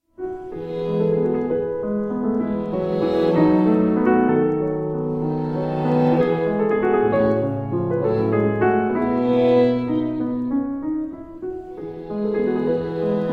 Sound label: piano trio in g major, gypsy rondo; Florestan Trio